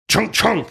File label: mimicking sound old fashioned pull tab machine